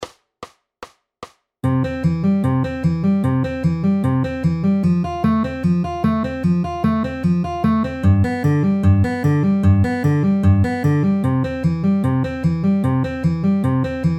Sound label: C F G C outer inner middle middle at 150 bpm